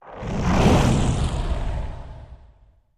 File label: Fireballs, medium fireball